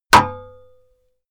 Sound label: metal hit wood; hits explosionen; metall